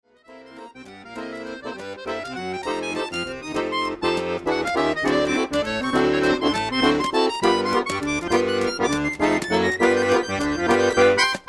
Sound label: Swing thing